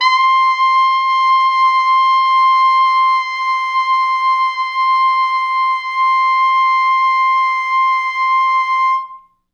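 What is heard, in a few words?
T Sax ext. range, tenor sax; C5; East West; Quantum Leap Horns Sax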